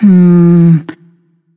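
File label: nonverbal thinking; smite; voicepack; izanami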